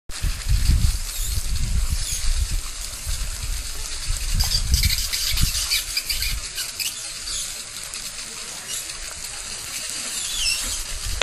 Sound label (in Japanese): mizuoto